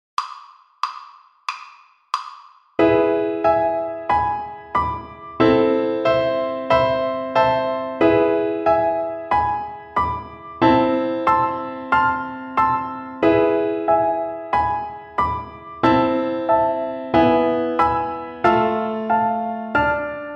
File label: Piano Accompaniments